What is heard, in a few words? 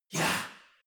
choir voice male whisper